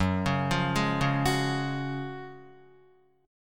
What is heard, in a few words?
Gb Major 7th Suspended 2nd, Guitar